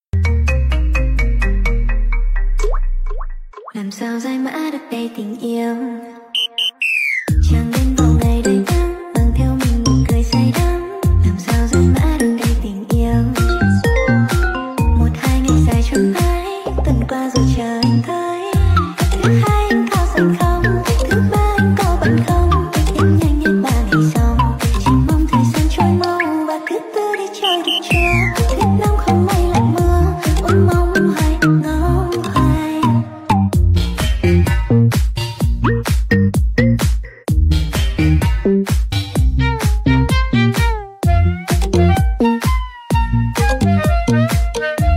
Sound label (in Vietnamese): Nhac Chuong Remix